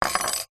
household bowl with metal fork in set down on wood; zapsplat